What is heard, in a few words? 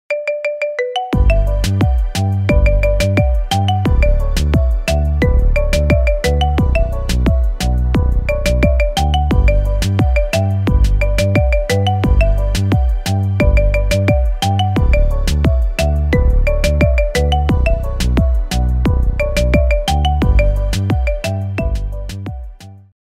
Marimba Cover